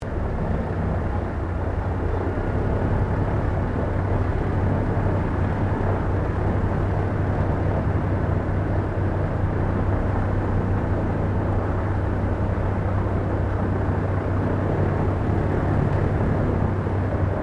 AIRCRAFT PBY CATALINA PREFLIGHT INTERIOR, Flying Boat; 1942; Airplanes (Sound Effects:Vehicles)